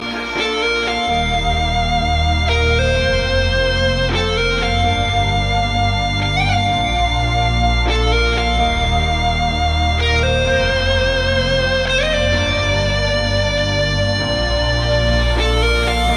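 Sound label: classical guitar; Klass Guitar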